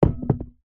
foley metal pole small drop carpet resonate; zapsplat

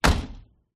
truck door old close

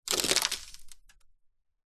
foley dust debris sand pour over car window

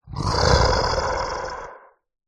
horror creature monster growl single; zapsplat

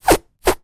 balloon air release short bursts x2; foley; zapsplat

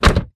transportation car door shut external; sound effects one

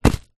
brick down on tarpaulin; zapsplat

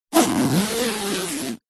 foley suitcase zipper

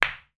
sport snooker ball cueball hit other

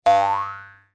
cartoon spring bounce twang

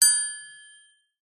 musical triangle single hit resonate

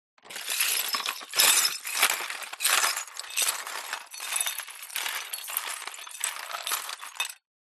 glass broken beer bottle wet sweep dustpan brush; foley; zapsplat

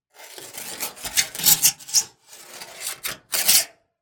Just Metal Scrapes and Scratches; soundbits

